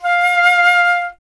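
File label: flute F; Miroslav Vitous; Solo Instruments VOL-2; Solo 2 Bank 1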